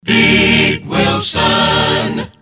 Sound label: jingles resings jock cut accapella; pams series 42a; big wilson